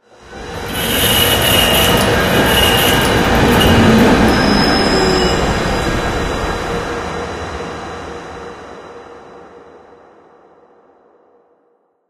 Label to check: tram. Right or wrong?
Right